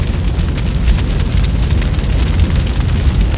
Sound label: fire